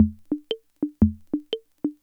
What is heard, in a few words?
Loop, classic machines, rhythm77